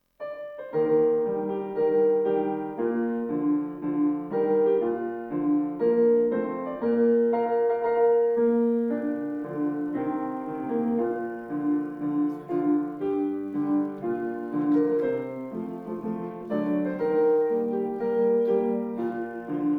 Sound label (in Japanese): Instrumental; 唐津市立切木小学校校歌; 切木（きりご）小学校; 佐賀県唐津市肥前町